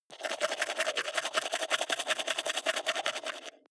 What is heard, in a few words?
teeth brush wet open inward fast